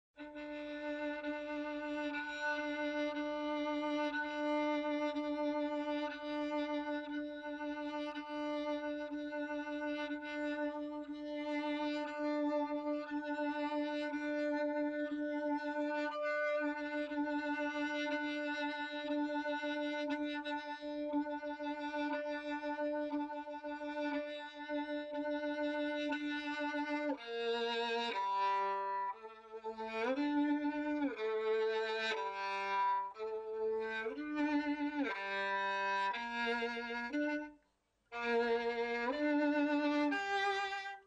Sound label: violin; Fiddlerman's International Youtube project